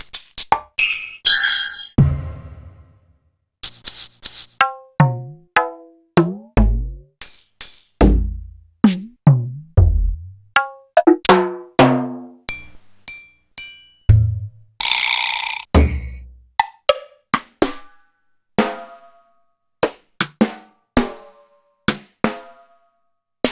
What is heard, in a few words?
Alesis DM52